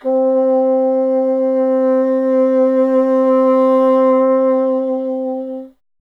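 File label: wind bassoon C; Miroslav Vitous - Solo Instruments VOL-1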